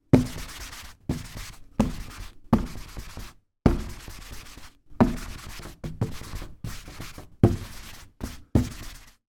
office blackboard rubber duster rub remove chalk from blackboard